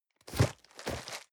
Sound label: cardboard box medium turn hard; objekte